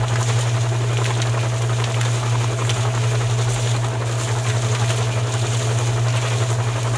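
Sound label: Mixer loop, machines mechanical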